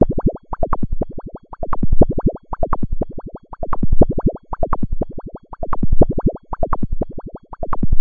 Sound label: WEEBLE TOMS; Studies in MASS